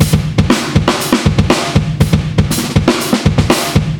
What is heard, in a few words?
Full Throttle, rock